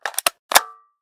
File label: toy gun cock; weapons; NS Studios - ultra sound collection